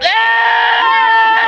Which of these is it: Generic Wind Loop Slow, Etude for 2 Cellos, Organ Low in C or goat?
goat